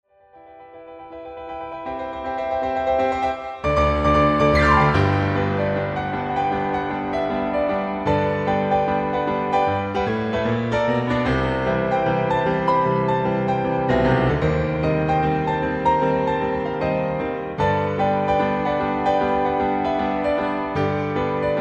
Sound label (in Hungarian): szintetizátor; adventi